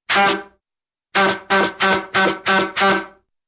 honks; chuffy

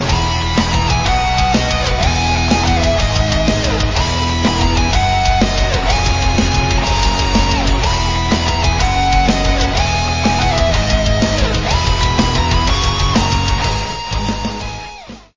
metal theme; frog

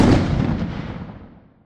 rumbling

